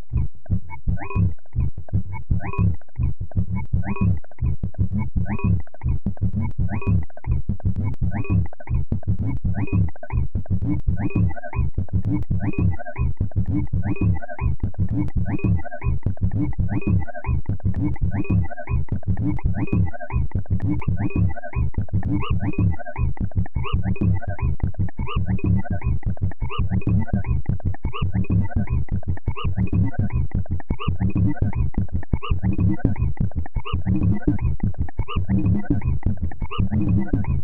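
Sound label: techno sine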